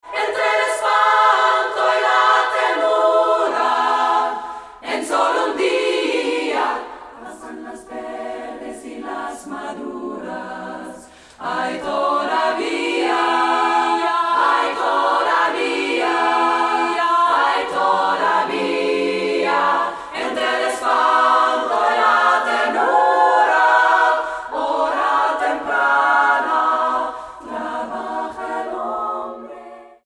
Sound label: satb